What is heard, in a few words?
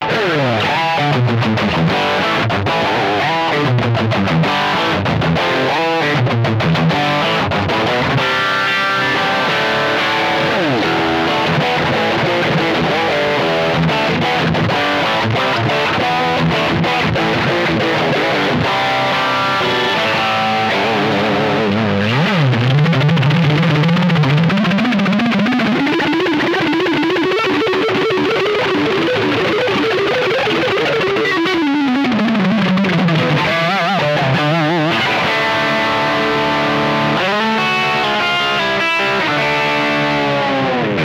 metal, preamp, speaker sims; ada mp-1; red box pro